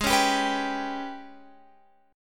G sharp Minor Major 7th Double Flat 5th, Guitar Open F; G#mM7bb5 strummed; x,15,13,14,14,14